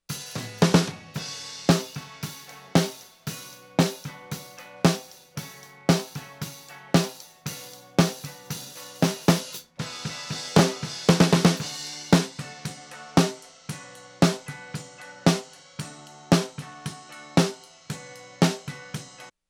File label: snare even 6 odd 7 speed 1